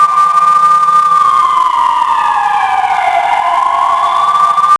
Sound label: Police Wail Siren Distant; cars; Max Payne